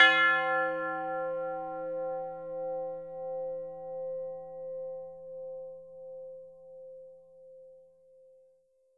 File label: tubular bells hit C4; AO bell